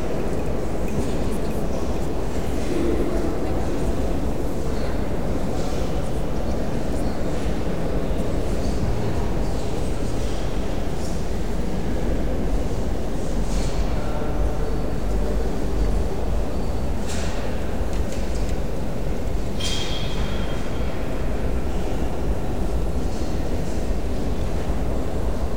garage, ambiances

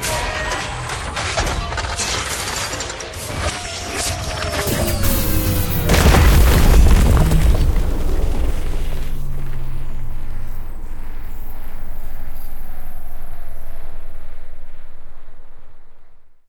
land; Cosmic Rage; general larkan

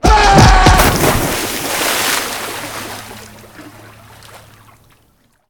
pool push; house

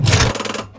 control stick, ships; Cosmic Rage; ships misc

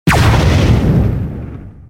other hit, ships combat; CosmicRage; CosmicRageSounds

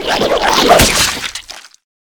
claw; ships; ratika

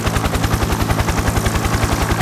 propellers

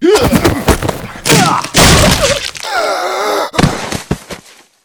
headdesk

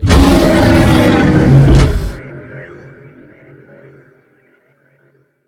big att, enemy droid; general combat

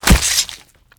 tiny blade, combat

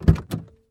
open door, vehicle; CosmicRage; CosmicRageSounds

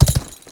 charge, horses; CosmicRage

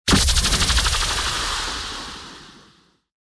debris laser, salvaging; CosmicRage; CosmicRageSounds; ships

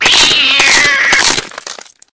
stack; CosmicRage

